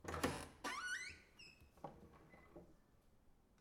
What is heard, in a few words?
Open The Door